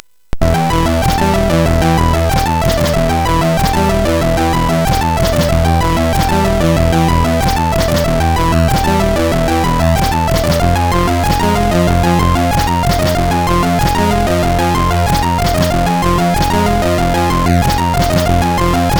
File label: sid MOS6581R4, GAMES; Dalek Attack